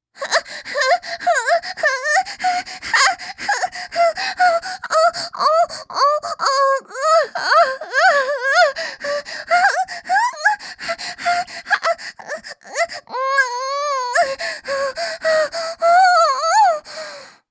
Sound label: High anime moans